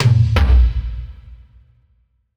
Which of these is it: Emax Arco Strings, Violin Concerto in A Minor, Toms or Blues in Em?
Toms